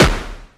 punch

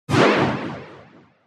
air feeding

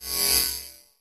spaceship door

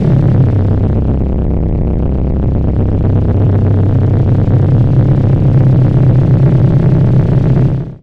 engine

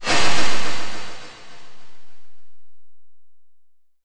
motion; animal

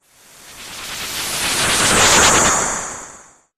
warp

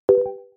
menu ok; operation

